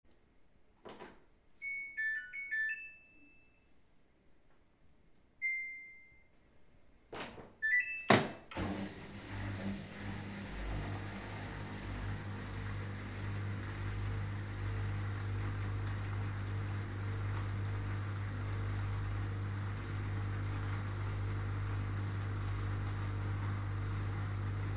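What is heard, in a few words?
washing shoes in the machine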